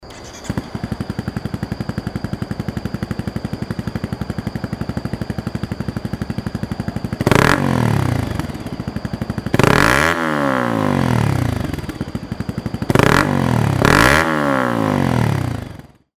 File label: crf250rally; crf250rally lci bff